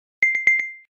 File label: Electric, notifications; Huawei Mate-40-RSR-Porsche-Design